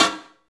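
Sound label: snare drum, 160 BPM, drum & bass; Ueberschall; Drum & Bass Flash Trackz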